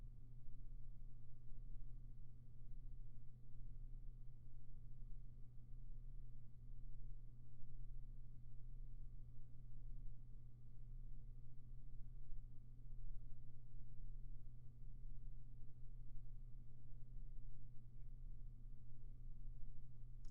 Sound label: room tone